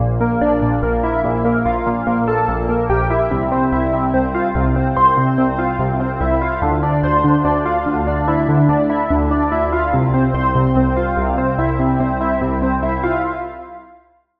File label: Key; Routed Sound; Sylenth1 Expansion - Reality Maker Vol.1